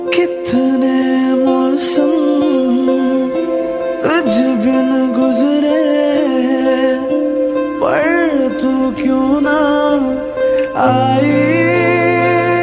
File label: bollywood flute ringtone